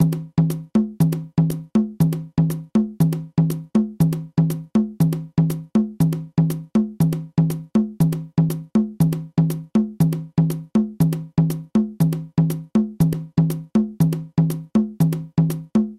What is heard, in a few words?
guaguanco habana tumba